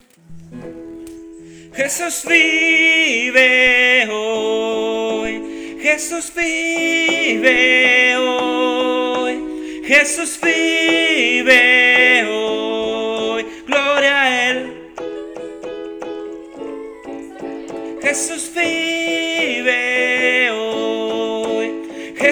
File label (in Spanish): Vive mi redentor segunda Voz